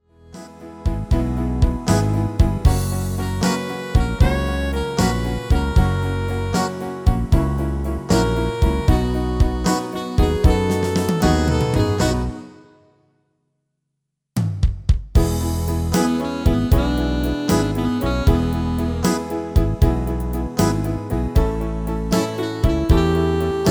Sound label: MIDI